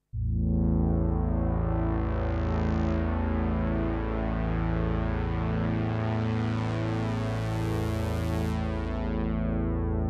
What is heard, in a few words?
Analookalike, Another traditional sound based exclusively on the ROMs saw, pulse and PWM waves; Korg 05R/W